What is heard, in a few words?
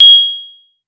Blip